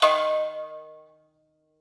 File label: single sham hit 4 transition; 129BPM; Pony Fan Music Remix Stems